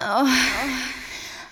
female orgasm; ooh ahh

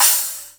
short, cymbals

